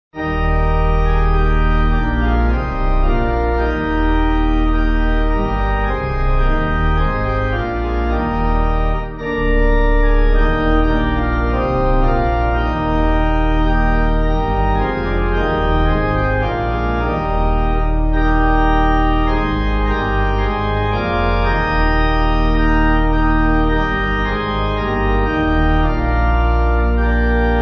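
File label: Pipe, hymn backing; O Day Of Rest; Meironnydd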